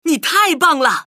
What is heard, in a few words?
rocktopus sample jazz